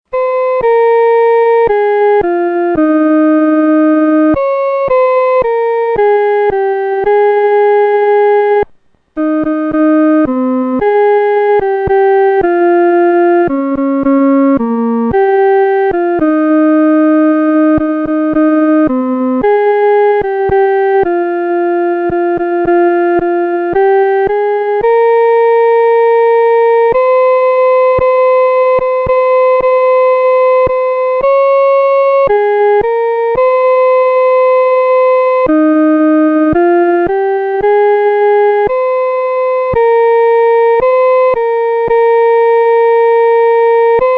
独奏（第一声）

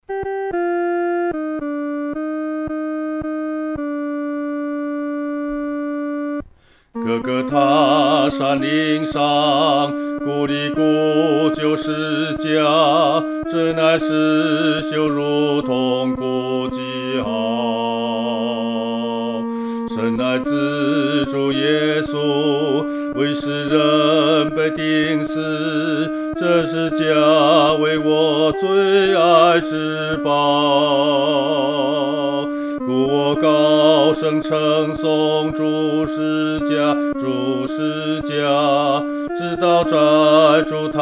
独唱 第二声; 古旧十架

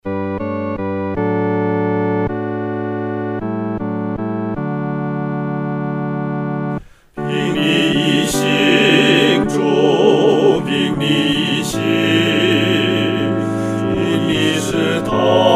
合唱（四声部）; 凭祢意行

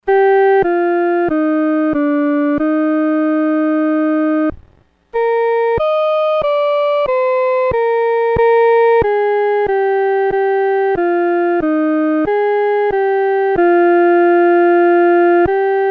独奏（第一声）; 万方团契

